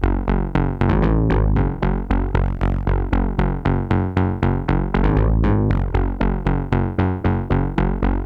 Verse Bass; Multitrack; Remix Parts

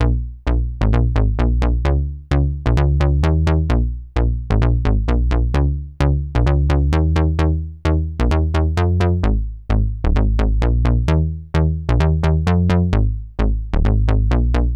Synth Bass

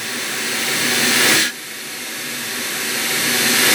128BPM Reverse; Shockwave - Fx Elements vol 2

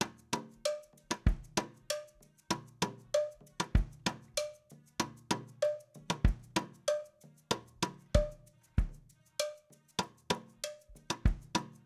Kit Verse

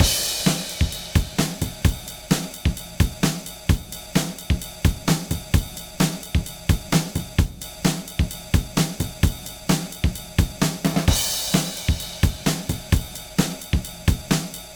Drums

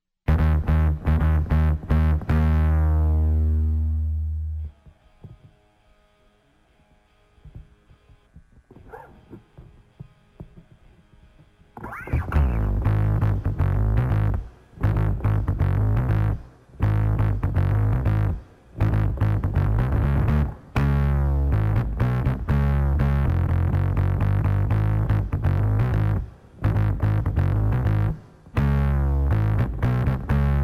Bass

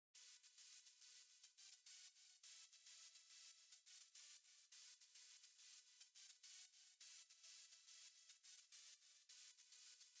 Synth Chorus Pass; Remix Sample Pack

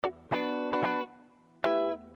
Guitar

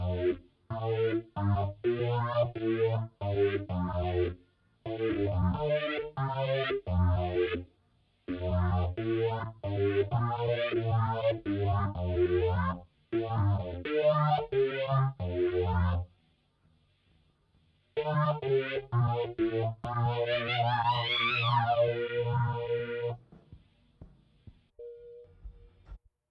Synth Prophet Intro Melody

